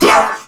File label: Pain; Warframe; Corpus Crewmen; Crewman C; Dialog Barks Battle